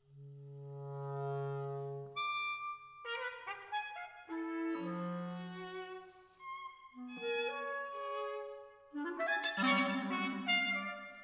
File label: Trio movement